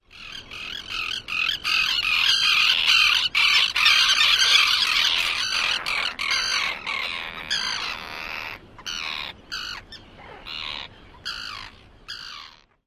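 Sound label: churring calls at nest, Common Tern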